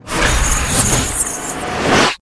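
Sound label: Wing Wide Cast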